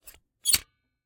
latch locker steel lever small close release squeak snap ring; sonniss gdc